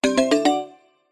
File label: multimedia alert mallet and chime positive; zapsplat